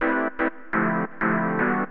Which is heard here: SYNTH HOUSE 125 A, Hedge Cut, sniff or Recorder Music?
SYNTH HOUSE 125 A